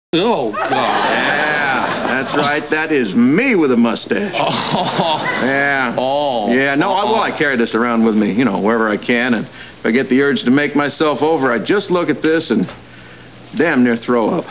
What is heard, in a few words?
throw up